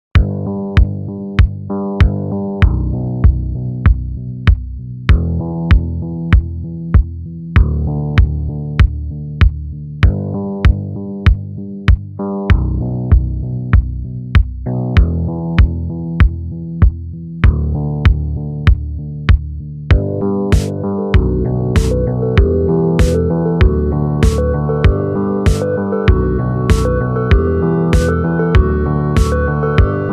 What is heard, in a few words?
instrumental slowed version